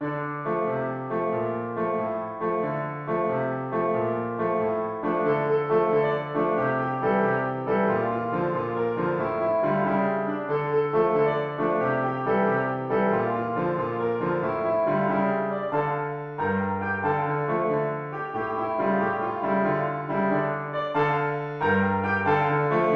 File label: piano; Easy-Intermediate Piano; Wacky Pirate-Themed Kids Song